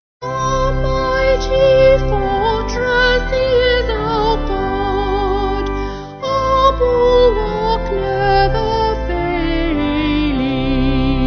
Vocal Organ; A Mighty Fortress; Ein Feste Burg

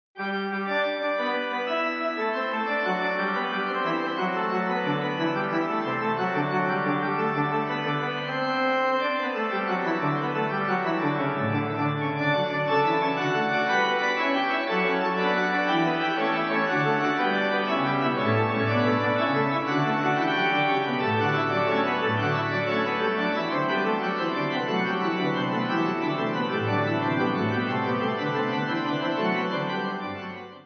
Gigue Fugue Pipe

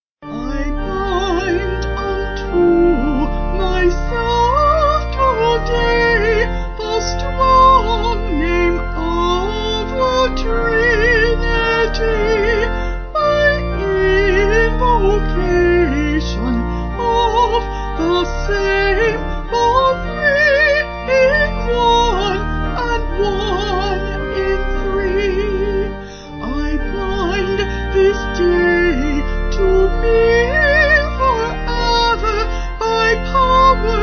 I Bind Unto Myself Vocal Organ

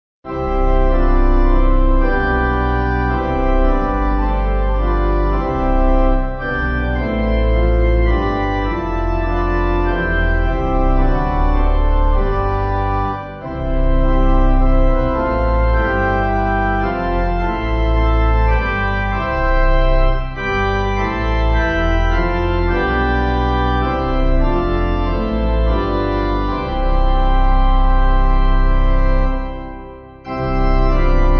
Lord Of The Home, Pipe; Organ; 8.8.8.8; Philippine

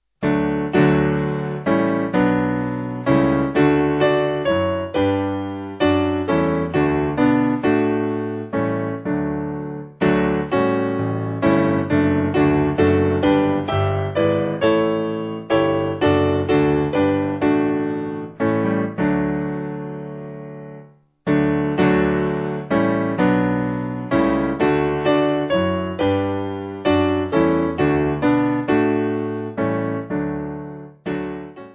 Piano, Music Backing Files for Churches

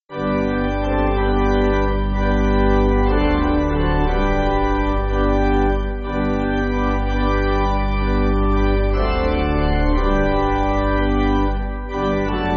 Pipe, Pipe Organ, Music Backing; James McGranahan

